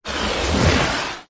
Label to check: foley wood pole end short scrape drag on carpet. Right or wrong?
Right